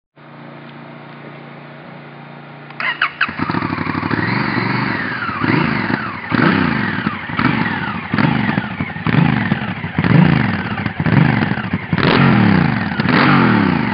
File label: Honda Africa Twin